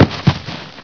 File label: body drop; source engine; hl1; video games